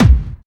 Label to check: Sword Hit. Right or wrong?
Wrong